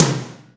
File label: TOM, Ensoniq ZR-76; 300 Drum Machines